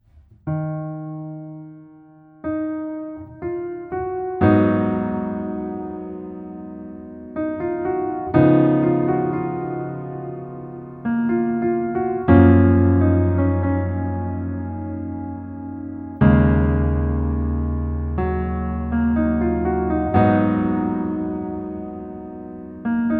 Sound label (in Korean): Piano ver, 멜로디MR, -1키